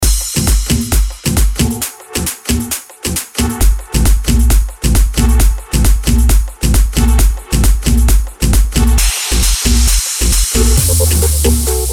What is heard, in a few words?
Bootleg Remix 134 BPM